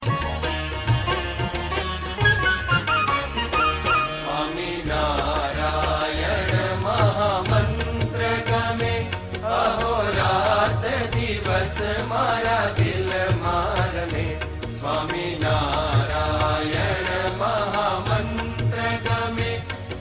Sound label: swaminarayan aarti